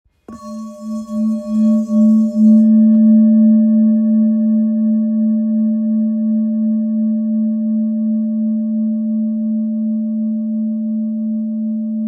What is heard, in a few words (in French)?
Bol ancien re